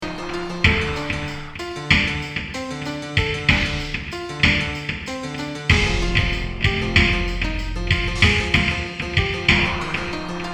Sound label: creepy scene